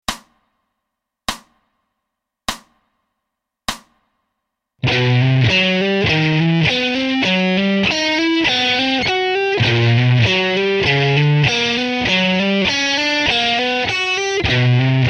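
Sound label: Arp Etude Chromatic Embellishment slow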